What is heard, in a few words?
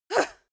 female moan pain short soft; koerpergeraeusche; menschen; soundpool